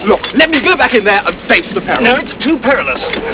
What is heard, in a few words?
peril